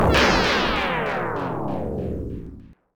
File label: Death; Bio Hazard Battle (Crying - Aseimei Sensou); Sega; Megadrive-Genesis